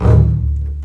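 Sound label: basses D1 marcato short; East West - The Ultimate String Collection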